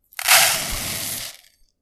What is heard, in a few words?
rice bowl